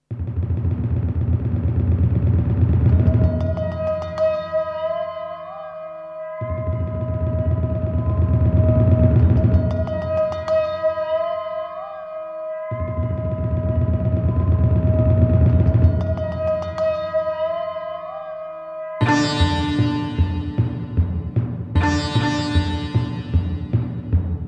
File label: indian sitar timpani and tibetan bells; riccardo turcotti